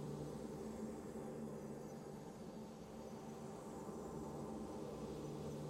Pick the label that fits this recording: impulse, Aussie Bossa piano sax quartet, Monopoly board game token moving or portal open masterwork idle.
portal open masterwork idle